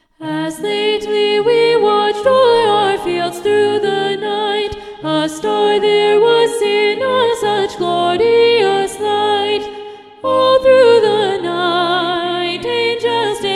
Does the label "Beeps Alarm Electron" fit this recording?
No